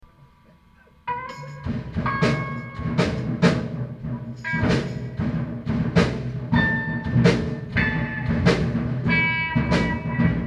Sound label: Noise Jam